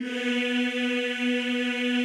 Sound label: EEH B2, Classical Choir; Best Service; Extended Classical Choir